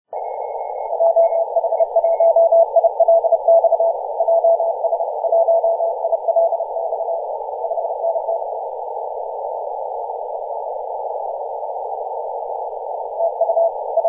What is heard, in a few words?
3.505 MHz CW